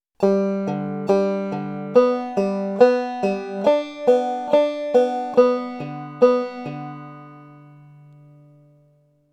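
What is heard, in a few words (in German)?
drop thumbing ohne fuenfte saite, banjo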